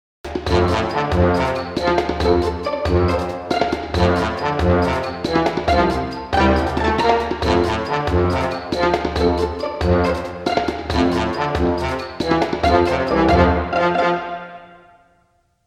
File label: Tromb astic Bumper